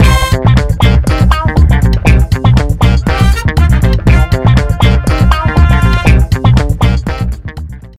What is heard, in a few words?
funk